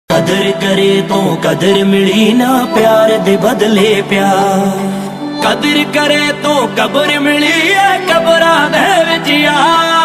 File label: punjabi ringtone